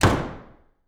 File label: Door Large Room Door Closing Mic Far In Hallway; Foley